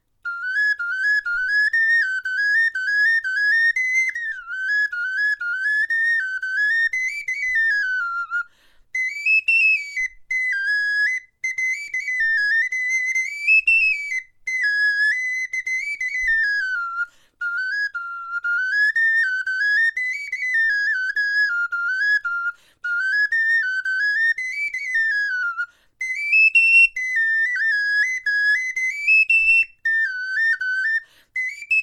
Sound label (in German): melodie, knochenfloete